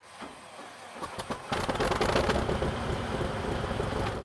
start engine, engines; World of Tanks

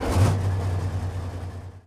start engine, engines; World of Tanks

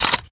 shot attach reload clip in, foley

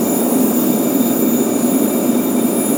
Aircrafts Engines APU; DCS

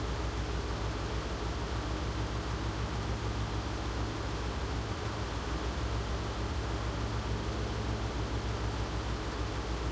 engines; World of Tanks

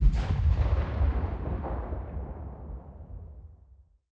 combat atmosphere; World of Tanks

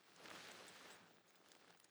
gear movement long slow; Rust

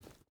footstep rubber boots forest jump start; Rust

